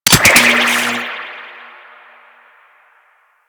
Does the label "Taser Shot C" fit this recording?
Yes